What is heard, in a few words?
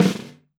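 Snare Drum Acoustic Roll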